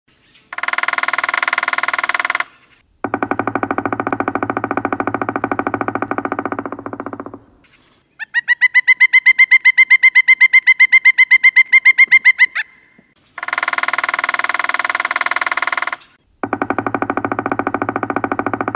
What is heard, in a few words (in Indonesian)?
suara kombinasi pelatuk